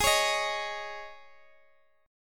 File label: G sharp Suspended 4th, Guitar, DADGAD; x,x,x,13,16,13